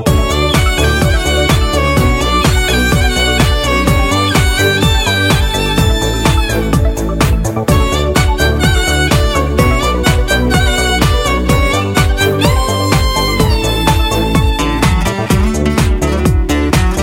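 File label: Instrumental Tone Telugu Ringtone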